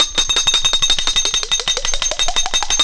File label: CHINA SPOON; Zero G - Ethnic; CHINA SPOONS